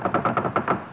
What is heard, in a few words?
Boat Creak; Civ4 warlords